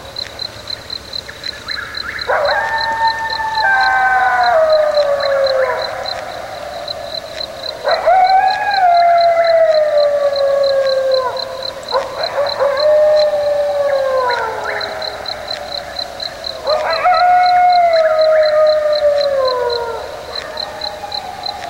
coyotes

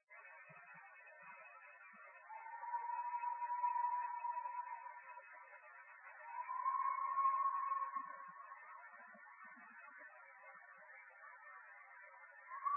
trinity river filtered; Unidentified Vocals Filtered

